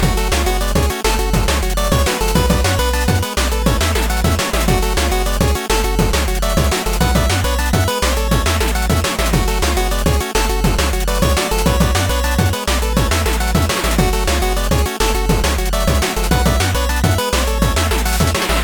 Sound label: boss fight short mono; Sigi A Fart for Melusina